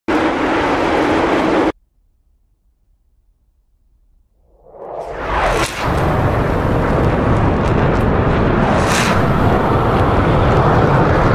Sukhoi Su 75

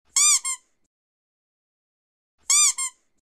Toy duck; اردک اسباب بازی

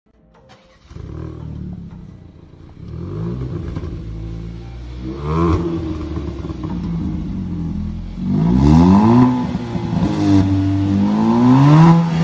Kia, اگزوز ماشین کیا

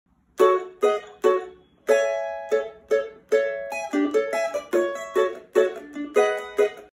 Cavaquinho; کاواکینیو

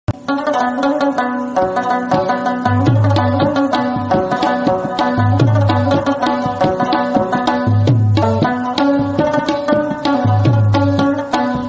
Rabab; Bibi Shirini; رباب

